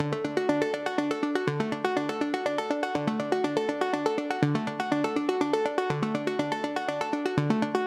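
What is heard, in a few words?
122 BPM Arps A major F minor Tonic D; arp essentials; Cycles of Motion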